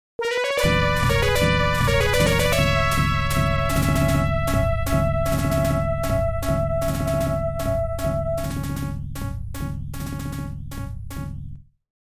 Success; Genesis

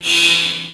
ROCK

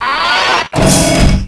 door; MMX4SFX

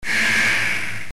Alert; Mega Man X4

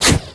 ROCK

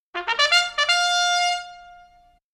Trumpet Call; Ren & Stimpy

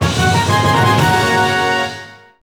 Level Up, Chiptune; Final Fantasy Tactics A2 - The Sealed Grimoire

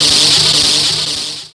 ROCK

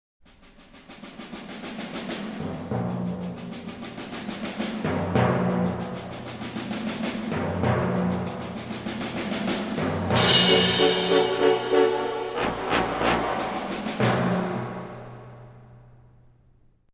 Exciting Percussion; Ren & Stimpy

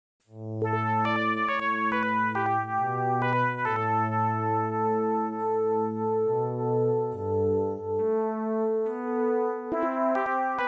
Peaceful; Genesis

